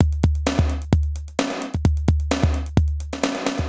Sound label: Alesis HR-16B (130BPM), beats